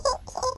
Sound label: string; tuxpaint; magic